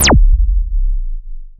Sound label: sub zap; Best Service Alpha Dance vol.2; ZAPS+THUMPS